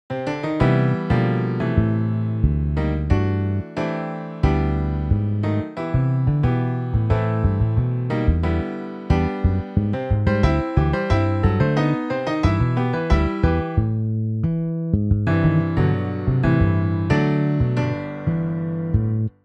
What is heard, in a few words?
Piano Bass MIDI